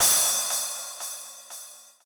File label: cym